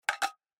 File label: CLOSING POT